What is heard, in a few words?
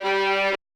VI Q F G2, Orchestra; AKAI Advance Orchestra Upgrade 97 Vol.1